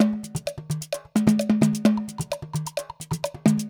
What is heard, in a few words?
Loop Percussion 130